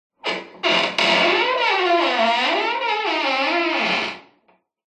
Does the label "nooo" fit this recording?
No